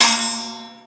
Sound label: Win; Pinguinos Biri Game; Android